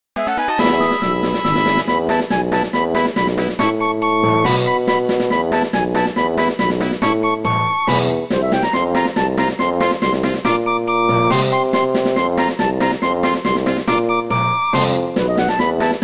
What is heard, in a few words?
Battle victory wild; Pokemon Solar Light & Lunar Dark 1.0.1